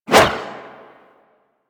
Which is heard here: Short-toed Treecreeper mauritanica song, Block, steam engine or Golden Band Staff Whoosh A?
Golden Band Staff Whoosh A